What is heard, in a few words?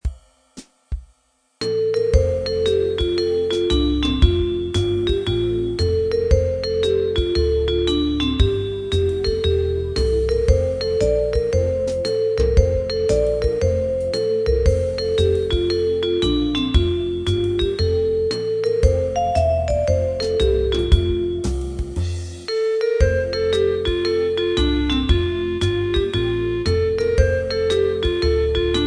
Jazz